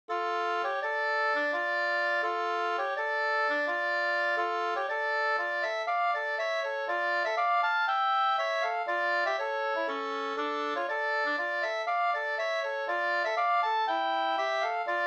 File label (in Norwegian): pols; fr Nitenholm e Ivar Ludnes utl av Kalle Almlof 2st